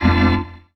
chords

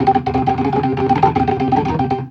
RIFF, ORGAN RIFFS; InVision Interactive; Keith Emerson

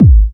Trance Kicks; Vengeance - Essential Clubsounds Vol.5; Bassdrums

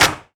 Claps; Vengeance - Essential Clubsounds Vol.5

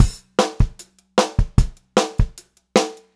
POP BT, Pop-Rock Drumloops; 152 POP

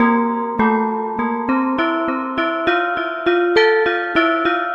Pelog Unison; Melodic; Ambient-Melodics-II

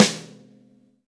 V-Pop Snare, Roland BK-5; Snares

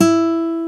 guitar E3; E-MU Formula 4000 Series Vol. 5; Protozoa; Proteus3 Presets

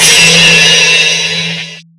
Hats, Alesis Performance-Pad

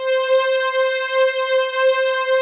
S STRING PAD; Z1 PADS; Pro-Rec - Synthsonic

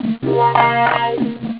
Wah Wah; jazz jackrabbit 2

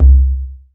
SI BIG BOOM, ETHNIC PER; AKAI S-Series CD-ROM Sound Library VOL-5

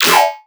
synth hit yoink layer; Virtual Riot

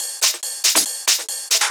Loops 140 BPM Hihat Percussion Loops

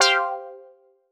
Quart Stab G; PowerFX - Nu Jazz House

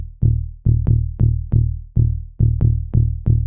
GROOVE BAS, Techno 138 BPM; RED ALERT

